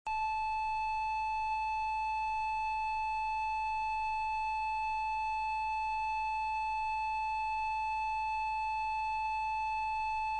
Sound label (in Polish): syrena